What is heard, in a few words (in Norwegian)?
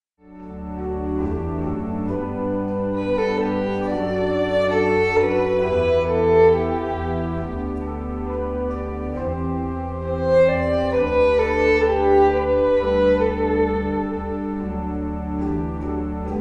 fiolin